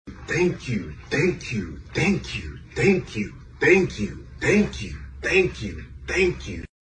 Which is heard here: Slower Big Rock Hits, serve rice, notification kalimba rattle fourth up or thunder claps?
thunder claps